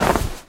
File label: Movement Tom flying Hit small; Talking Tom Gold Run